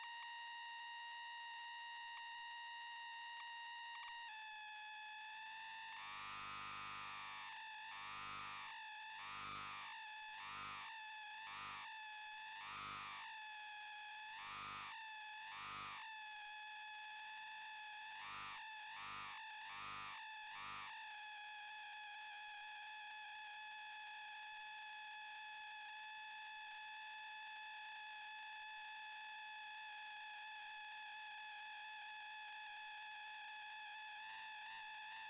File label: Electrical Noise High Tone